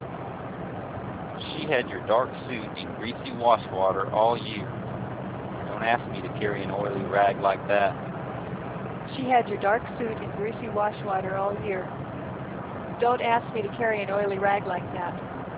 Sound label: car, noise